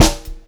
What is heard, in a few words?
Pop-Rock Drumloops; 126 POP 4